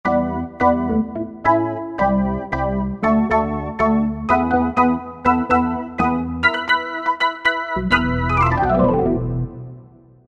Rock Organ; Korg MSC-05S Piano

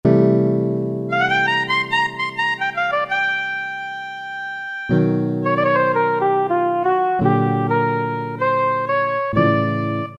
E Piano n Sopranino; Roland PN-D50-01

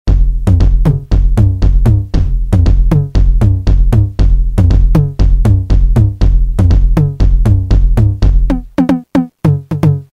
909 Tom; Roland M-DC1

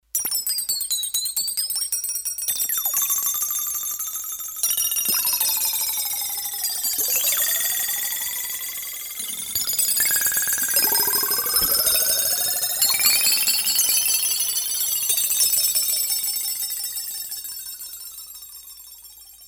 Water Bells, Roland D-10; Factory Patches

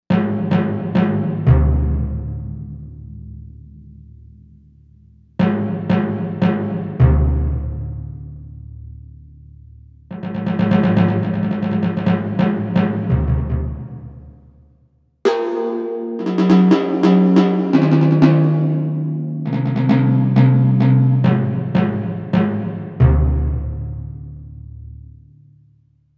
Timpani, Korg M1Rex; Factory Patches

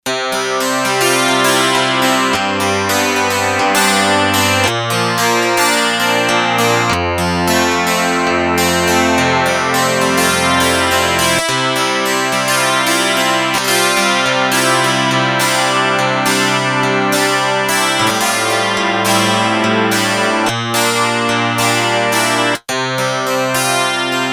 12 Strings, Yamaha TX802; Factory Patches; Voices

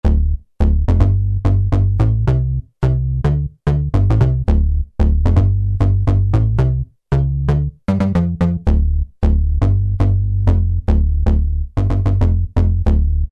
House Bass, Kurzweil K2000; Kurzweil K2000 Contemporary ROM; Contemporary ROM Programs and Demos diskette

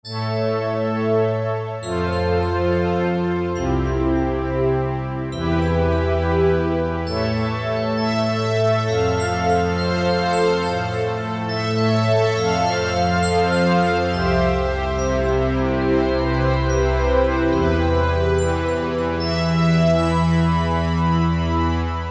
Symbiont, Choir & Pad; Factory Voices

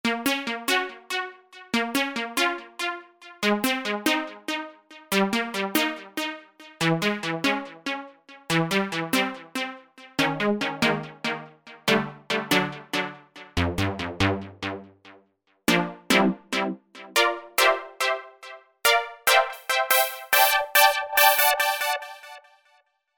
SYNTH TEXTURES - Echoes; Patara Echoes; Apple GarageBand 2

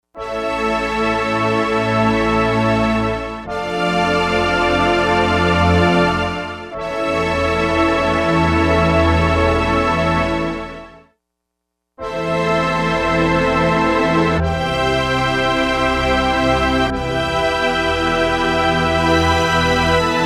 Or Choir Stack; Digital Synthesizer

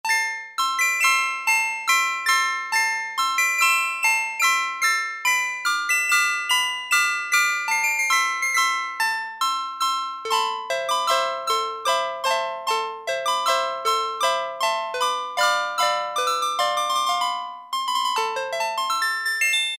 Celesta, Roland JX-3P

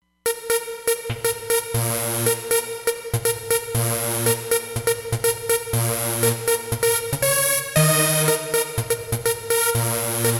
Houze Clavi, Roland Fantom XR

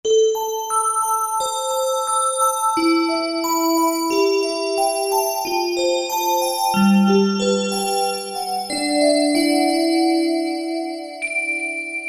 VS Bell Boy, Korg TR-Rack

